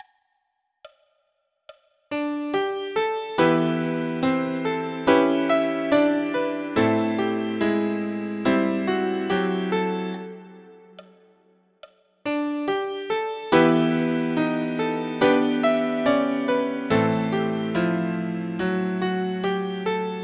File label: Danny Boy, Line cliches, minor